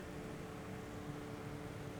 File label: pulses, BALAENOPTERA; MONACO 2022-07